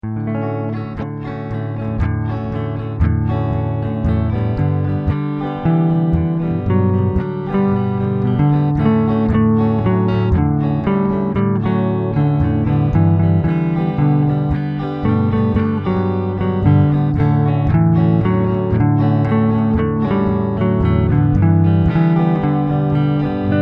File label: train with synth